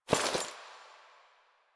Body Move; Frumentarius; Warframe; Ambience; Nineteen Ninety Nine; DE

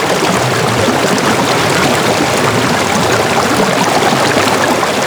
Shipyards Sludge Fall, Ambience; Warframe; Grineer Shipyards

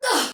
Pain Soldier Female, Battle Barks; Dialog; Sinecure

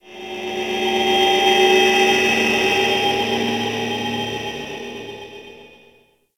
Landscape Orb Crater Drone, Ambience; Warframe; Venus Open World; One Offs

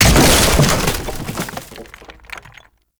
Tree Circle Planter Large Destroy; Warframe; Ambience; Nineteen Ninety Nine

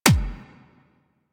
weapon punch pistol; Warframe; Weapons; Pistols

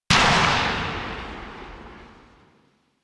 Pistol Charged Tail; Weapons; Warframe; Drifter; Operators

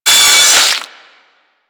Flesh Impact, Weapons; Warframe; Tenno; Miter; Hit Effects

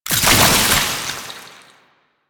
Grenade Pickup Cold; Alchemy; Warframe; Entrati

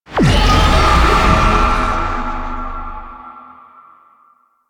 Tank Infested Heal Lure Call; Enemies; Abilities; Warframe; Lasrian

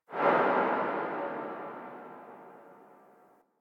Charge Gun Tail A; Crp Charge Gun

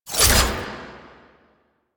Whoosh C, weapons; Warframe; Gunsen Prime

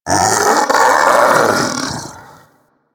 Infested Splitter Vocal Death; Warframe; Grey Strain

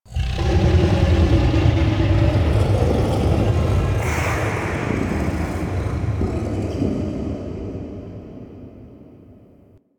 Brain Grunt One Off; Warframe; Infested Eris; Inf Flesh Scape

